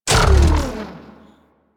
Mech Stop; Warframe; Vehicles; NechroMech

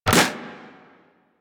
Primary Shot, Weapons; Sapient

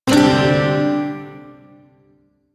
Pent Maj Chord D; Warframe; Duviri Shawzin

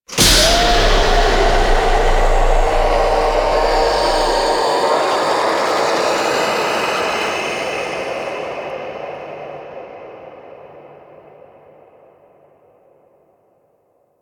Tunnel Wipe; Ambience; Warframe; Infested; Golem Trial

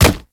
Step Default, Footsteps; Infested

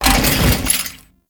Bodyfall

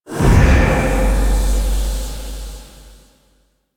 Survival Corrupted Aura Inner Despawn; Warframe; Zariman

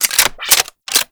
Shock Rifle Reload, weapons; Warframe; Corpus

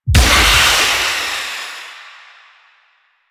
Health Leech Activate; Warframe; Eximus

